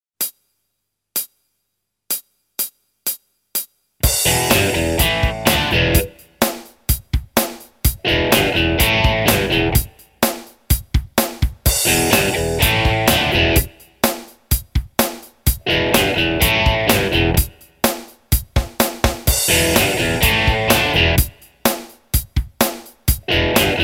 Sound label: Riffs